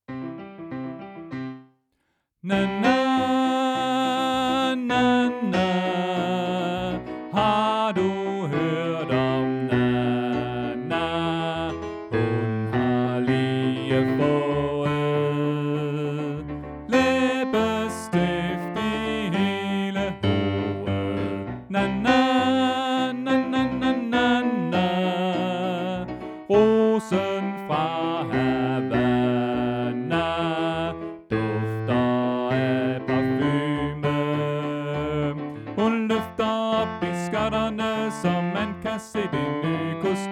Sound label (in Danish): Bas; oevefiler